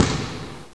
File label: Hit Floor; Intel; Createshare; PC Camera Games; Shoop